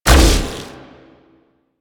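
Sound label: Madurai Contamination Wave Projectile Impact C; Warframe; Focus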